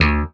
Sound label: POP BASS D1; E-MU Formula 4000 Series Vol. 5; Protozoa; Proteus1 Presets